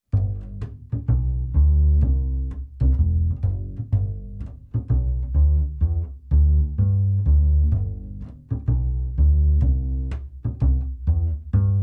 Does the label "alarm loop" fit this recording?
No